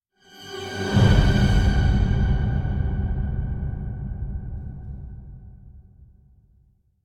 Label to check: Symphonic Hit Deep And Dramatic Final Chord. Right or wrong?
Right